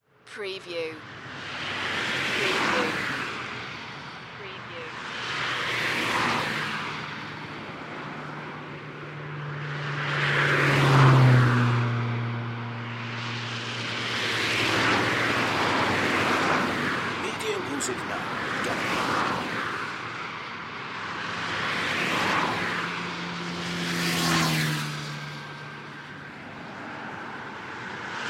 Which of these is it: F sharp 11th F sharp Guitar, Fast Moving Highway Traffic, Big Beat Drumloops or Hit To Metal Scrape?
Fast Moving Highway Traffic